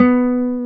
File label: guitar classical guitar, nylon string; Roland LCDP02 Guitar and Bass